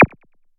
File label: Little Zap Zaps